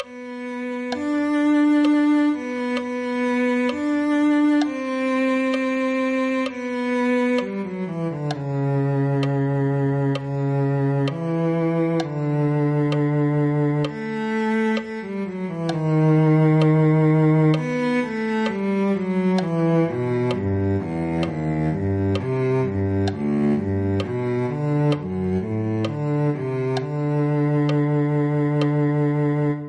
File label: cello